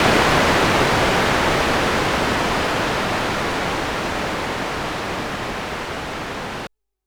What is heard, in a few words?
SH101 Raw, White Noise Raw; noise hiss crackle